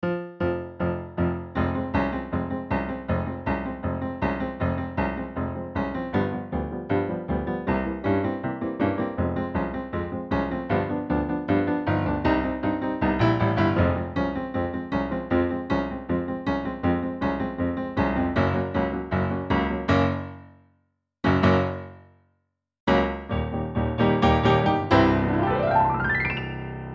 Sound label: Piano; Copa; Singing Audition Pieces; Sam